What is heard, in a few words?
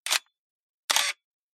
Camera Film